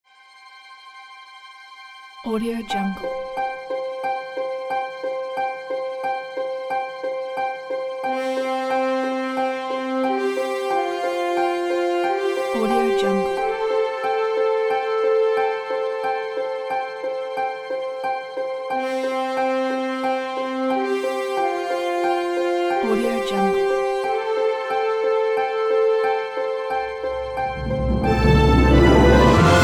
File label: orchestral opener